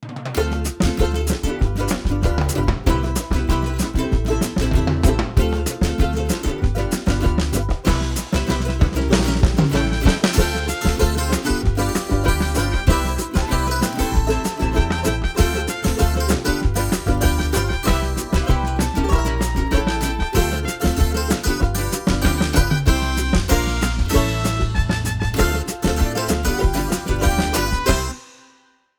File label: samba